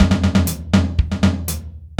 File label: BPM120 Dry, dryLoop